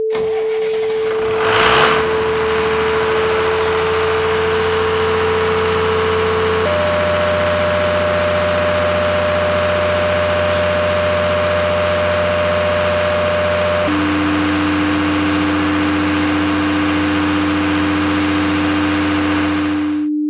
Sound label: 8 cyl start run